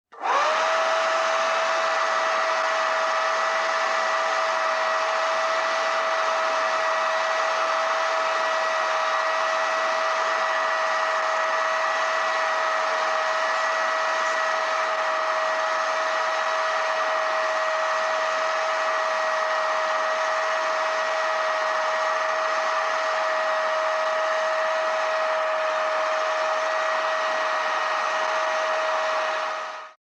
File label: hairdryer